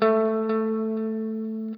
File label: NOODLE LONG, Guitar & Bass